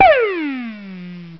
Laser, Cheesy Lo-Fi Sound Effects

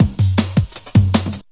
158 straight, drum loop; 141 to 160 bpm

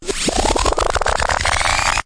super rewind me; Weird and Interesting Hits

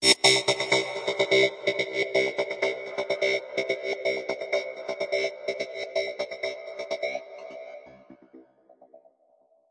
126 space banjo; SYNTH AND ELECTRONIC LOOPS

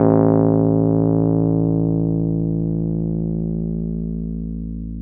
RHODES A0, Rhodes Electric Piano; SYNTH KEYS ETCETERA

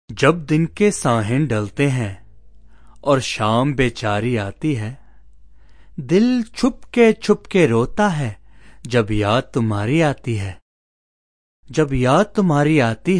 deep india, Indian Singing

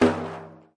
arabic perc, Arabic Percussion Kit; Arabic Percussion Kit 2

